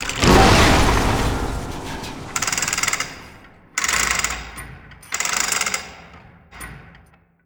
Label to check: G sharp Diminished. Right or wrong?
Wrong